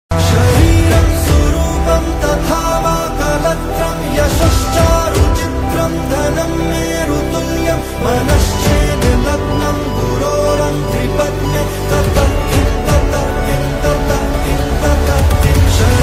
gujarati ringtone

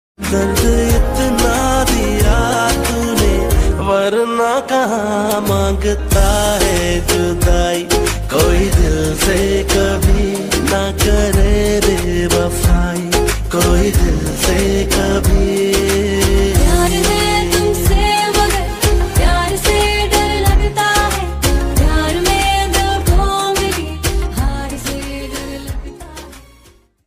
pakistani ringtone

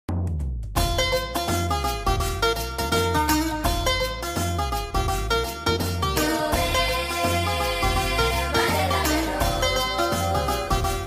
Instrumental ringtone

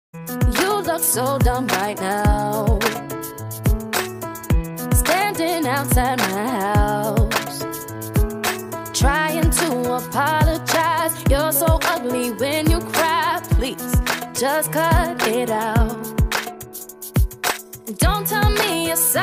speed up english ringtone

